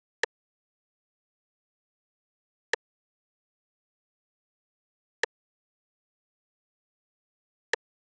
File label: Drum Loop, 96 BPM Percussion; Cymatics; Vibes II Drum Loop Collection; Cavern Drum Loop